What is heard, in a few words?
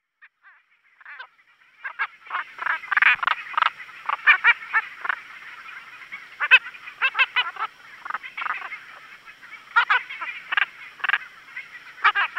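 Osteocephalus leprieuri, frog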